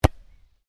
Football kick close, Soccer Ball: Kick Ball, Close Aspect; Sports; Stuart Duffield